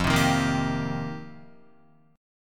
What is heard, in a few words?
F 7th Suspended 2nd Suspended 4th, Guitar; F7sus2sus4; strummed; JGuitar